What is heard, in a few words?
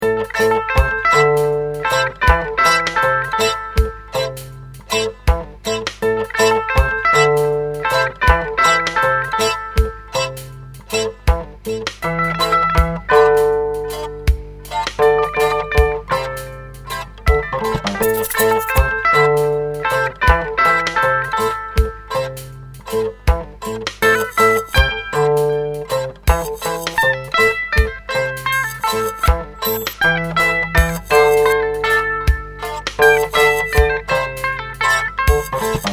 dub charango walk